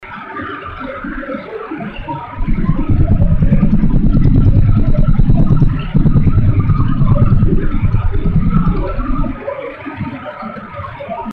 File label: Low frequency surprise stream after tuning